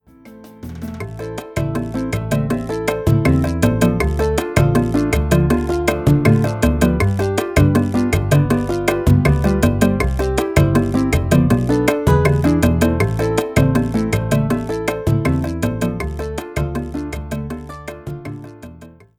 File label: Backing Track